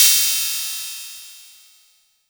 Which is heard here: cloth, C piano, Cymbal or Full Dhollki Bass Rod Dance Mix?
Cymbal